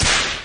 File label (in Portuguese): chicote